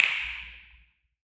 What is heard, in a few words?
Dual Finger Snap Snare